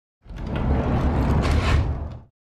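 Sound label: Dresser Rolled On Wood, scrapes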